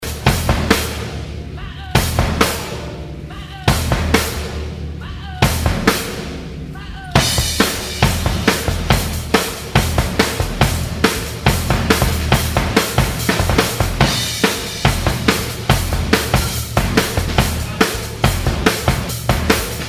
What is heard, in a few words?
Drum outtakes